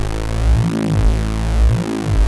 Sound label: OB VOX A2; Hollywood Edge - Giorgio Moroder Rare Synthesizer Collection; OB 4 VOX.